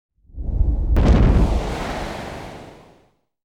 fire low rumble; Asian Show Sound Effects

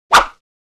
swish single; Asian Show Sound Effects

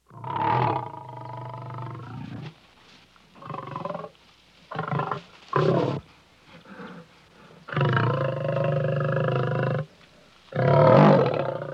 lion

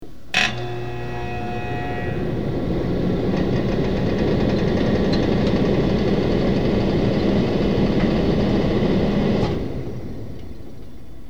heater

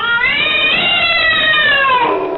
cat screech

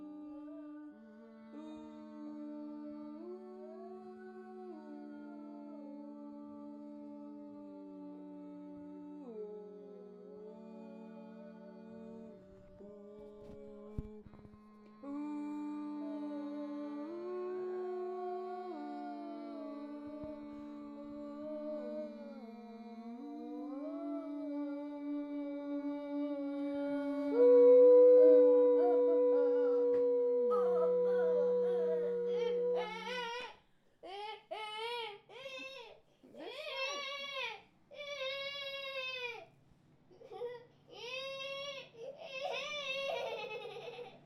harmonies

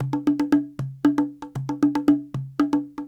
Cahon

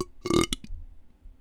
burp

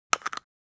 bone break; fights bodyfalls; Asian Show Sound Effects

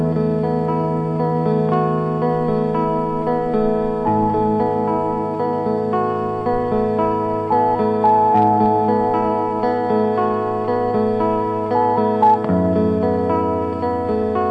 tranquil song

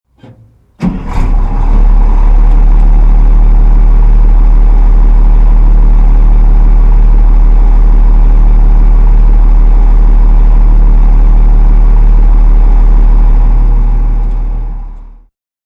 dozer; bulldozer

